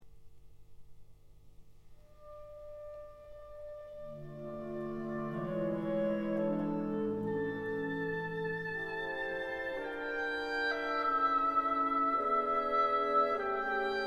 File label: Piano Concerto